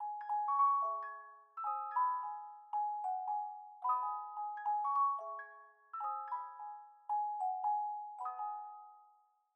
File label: intro Melody